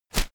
whoosh shredded paper quick

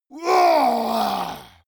monster growl attack throaty; schreie

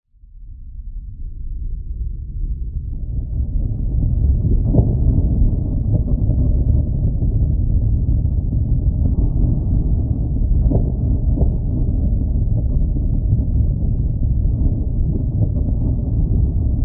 deep rumbling, Weltraum

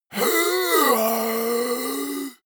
monster growl hoarse; koerpergeraeusche; comedy-cartoon; soundpool

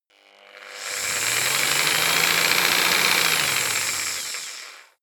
jigsaw engine slow medium length

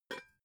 pot lid take off gentle; objekte; topf

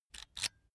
electric drill template adjust regular; soundpool

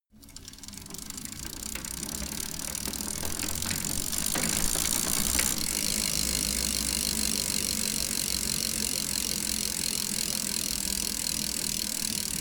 bicycle spinning wheel

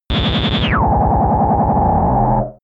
metallic shooting; digital

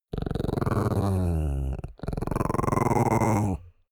chihuahua growl long; natur-tiere; hunde

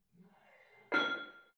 barbell plate slide in hit ring reverby soft; sport freizeit; fitnessstudio

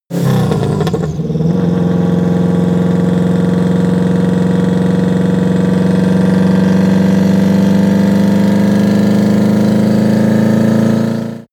car vw t1 idle drive accelerate

